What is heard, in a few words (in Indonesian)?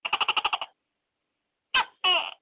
Tokek